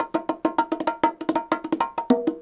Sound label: bongos 100, latin, 100bpm; Sound Effect Library